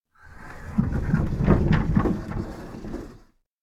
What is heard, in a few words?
door janitor room open peek; anemoi